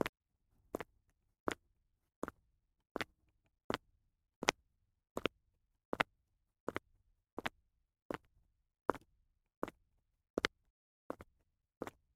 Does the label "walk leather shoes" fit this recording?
Yes